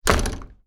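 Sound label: rattling of wooden door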